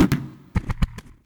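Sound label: SUPRA backfire exterior down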